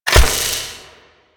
Rain Of Arrows Impact; Warframe; Duviri; Duviri Dax; Abilities; Dax Archer

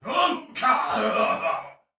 Death, Soldier Male; Sinecure

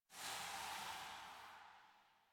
Pistol Reload Smoke; Weapons; Warframe; Tenno; Priest Pistol

